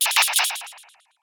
Double Zap

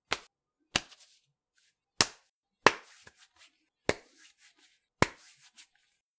Body Grab Hands Check Salutation

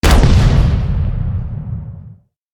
Grenade Mid Distant Explosion

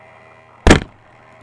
Hit

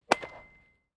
Baseball Hit

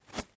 Grabbing Something Fast

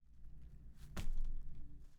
Drop Stuffed Toy Hard

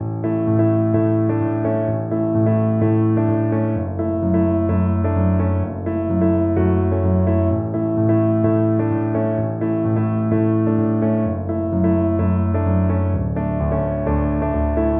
Show Upbeat Piano

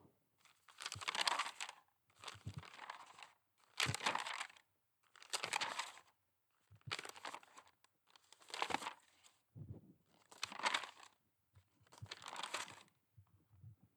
Paper Flipping

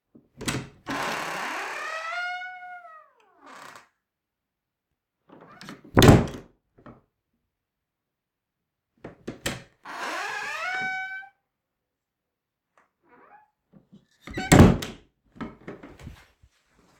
Opening And Closing Creaky Door

